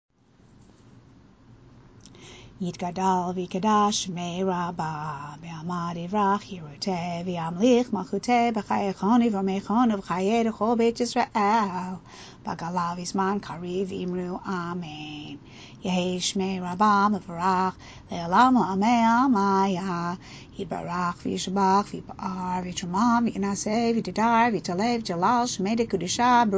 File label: weekday amidah; weekday shacharit